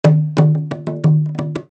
Kendang

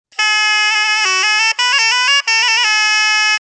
Algaita

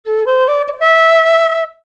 Kena

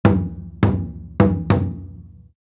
Odaiko

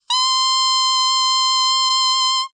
clarinet reed

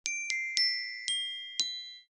glockenspiel

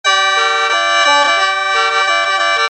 Khaen

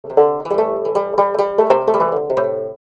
Krar

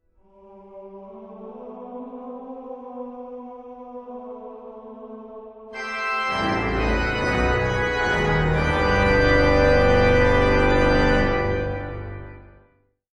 e-organ

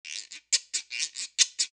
guiro

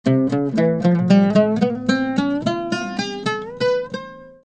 Ud

